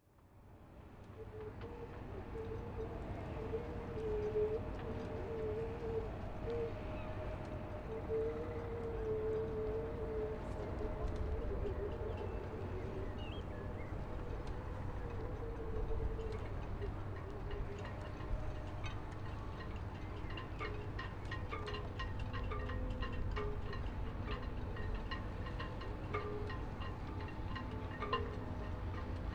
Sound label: Harbour Walk, Bangor; IRE